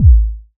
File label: Kick Sub 909 Long